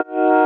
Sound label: Eb STAB, funky